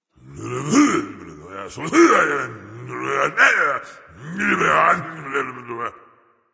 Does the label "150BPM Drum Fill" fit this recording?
No